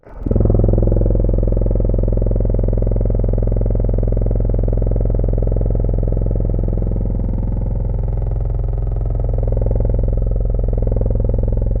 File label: Saxaphone; Instruments; Synthetic's Serum Collection; Echo Sound Works Core Tables